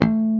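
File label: BASS harmonics A2; E-MU Formula 4000 Series Vol. 5; Protozoa; Proteus1 Presets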